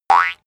boing classic long

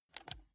console controller option press fast

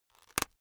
plastic slowly pressured

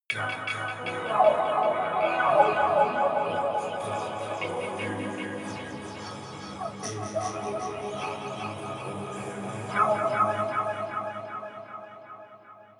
vocoder vocals

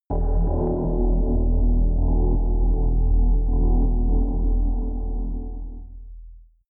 rumble synth

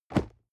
nylon leather bodydrop

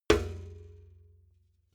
metal bucket hit

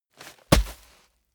sand bag drop

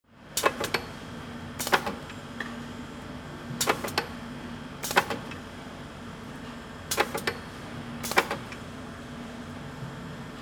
closing tins of paint

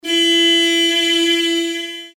horn

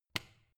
switch turn on hard

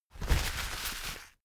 paper towel roll sheet crumple fast

